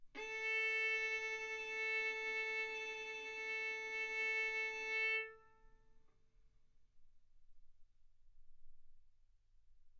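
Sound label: Violin arco mf A string A4 B4 stereo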